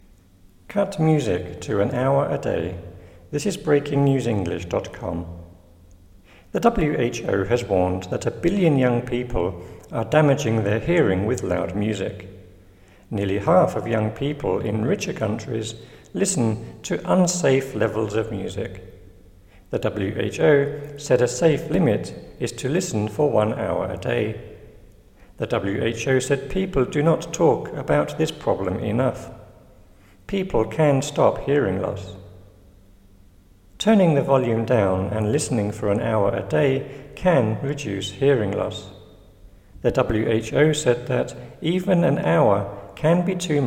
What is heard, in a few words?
loud music